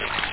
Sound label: PLIP